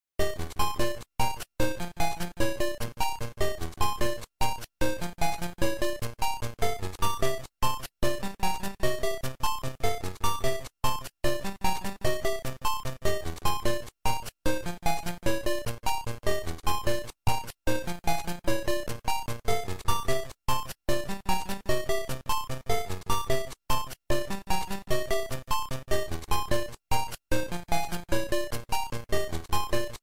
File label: Overworld Map; Super Mario Land 2: 6 Golden Coins